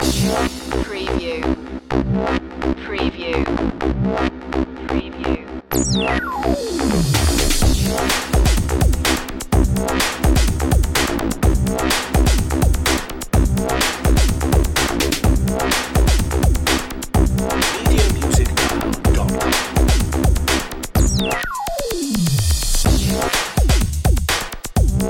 acid drop

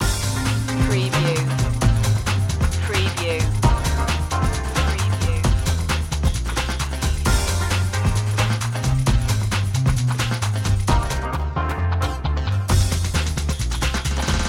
funk head loop

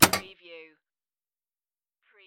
Pinball sound, up and down clank

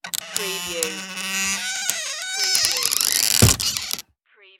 wooden hut door close

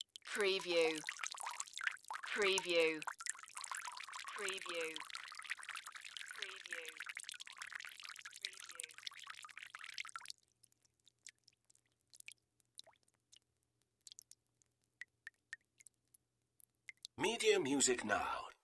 Water Drips; Sound Effects Bible

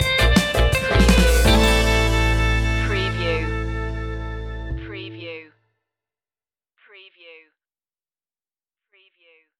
spanish tango music stinger

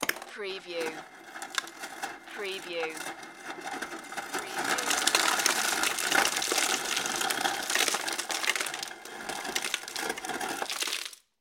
Ice Maker Dispense; sound-effects-bible

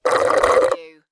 Straw suck; beanstalk audio

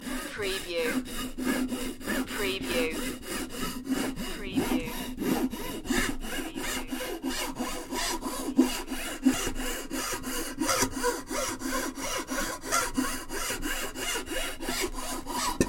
Wood File

